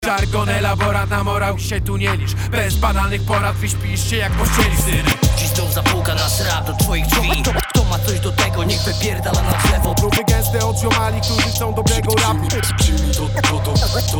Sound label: Scratch Cut Refren